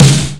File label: POWER, SNARE